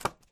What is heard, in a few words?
b open